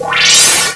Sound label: HVY VOLT